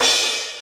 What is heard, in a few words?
subtle reverb open high hat d sharp key